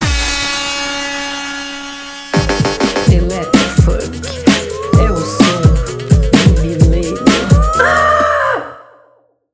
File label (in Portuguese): eletrofunk